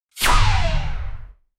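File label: kriss vector submachine gun, automatic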